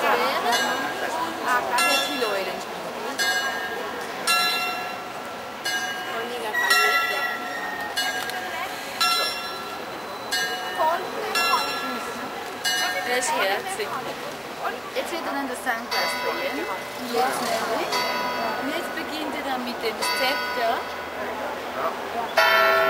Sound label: astroclock bern